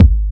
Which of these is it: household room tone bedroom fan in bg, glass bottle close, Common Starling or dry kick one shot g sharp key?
dry kick one shot g sharp key